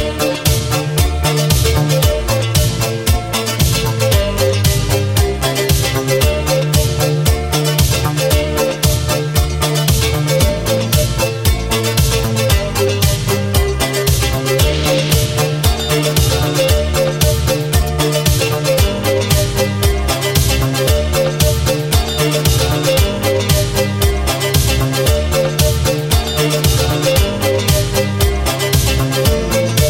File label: instrumental ringtone